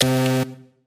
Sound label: gameshow chip fail